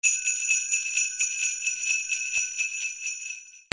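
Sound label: percussion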